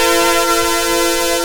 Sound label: CHORD SYNT; Big Beat Samples & More; AKAI; FX LOOPS KIT